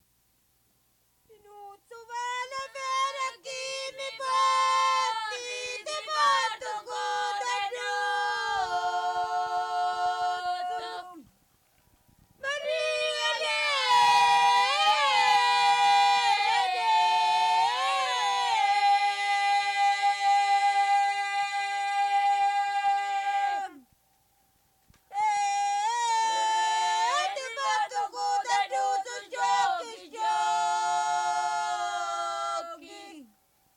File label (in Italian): canto di mietitura; ninuzzu vai a la fera; Cardeto; Mammola; Cinquefrondi